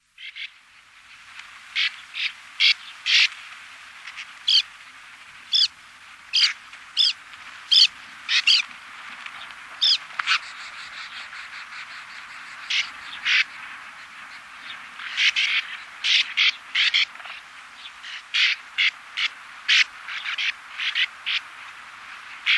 Riparia riparia; Sakhalin; Kuril; sea; priroda sakhalina i kuril

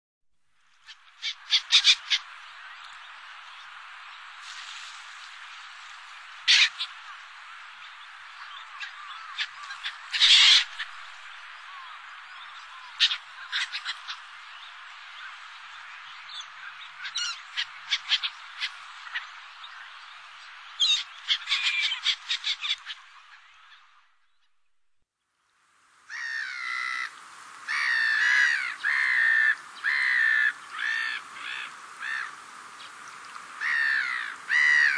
Larus ridbundus; Sakhalin; Kuril; sea; priroda sakhalina i kuril

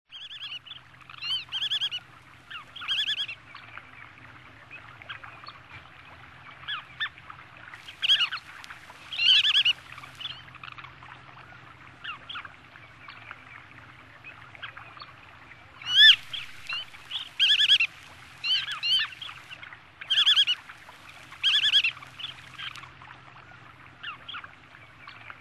Limosa limosa; Sakhalin; Kuril; sea; priroda sakhalina i kuril

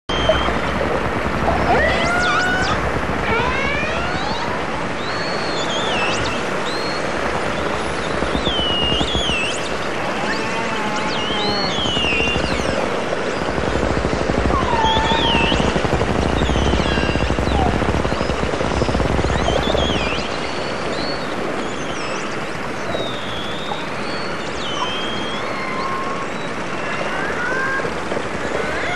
orca; Sakhalin; Kuril; sea; priroda sakhalina i kuril

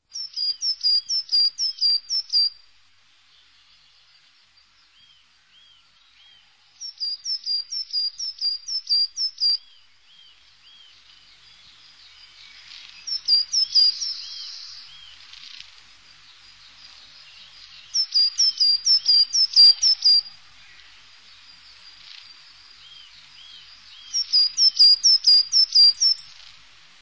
Parus ater; Sakhalin; Kuril; sea; priroda sakhalina i kuril

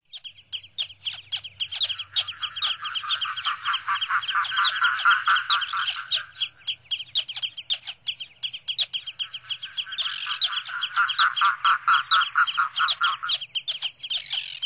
Gavia stellata; Sakhalin; Kuril; sea; priroda sakhalina i kuril

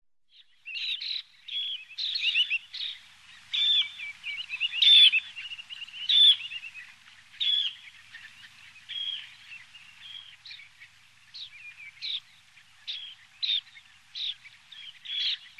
Calidris alpina; Sakhalin; Kuril; sea; priroda sakhalina i kuril

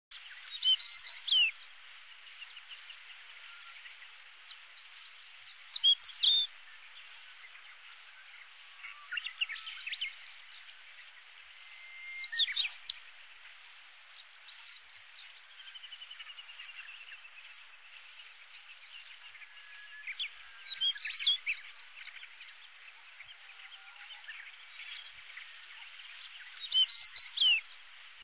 Saxicola torquata; Sakhalin; Kuril; sea; priroda sakhalina i kuril

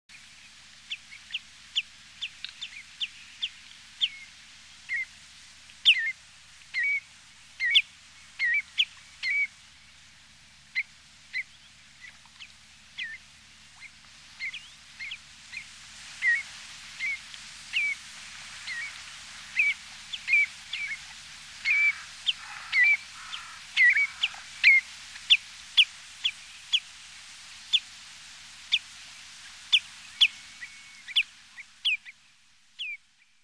Tringa totanus; Sakhalin; Kuril; sea; priroda sakhalina i kuril

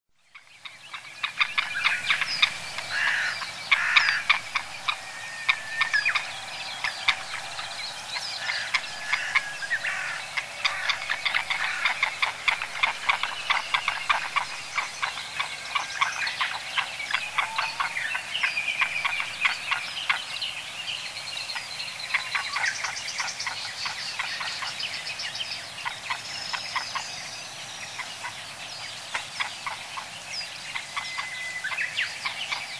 Haliaeetus albicilla; Sakhalin; Kuril; sea; priroda sakhalina i kuril

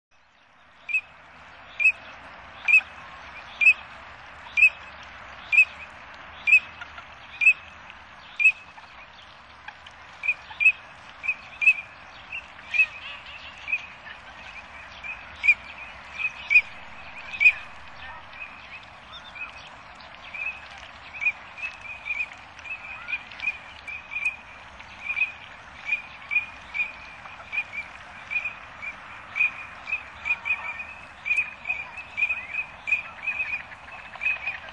Anas cressa; Sakhalin; Kuril; sea; priroda sakhalina i kuril

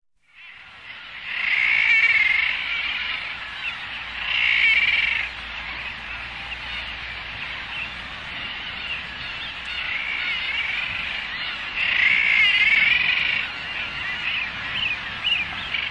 Uria aalge; Sakhalin; Kuril; sea; priroda sakhalina i kuril

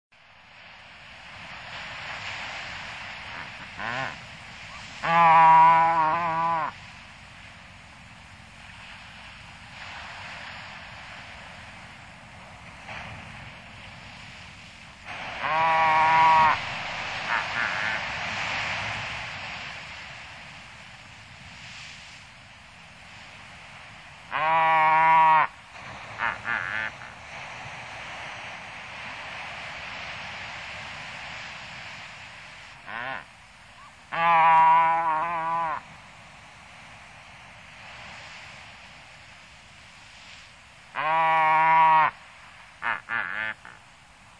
Diomedea albatrus; Sakhalin; Kuril; sea; priroda sakhalina i kuril

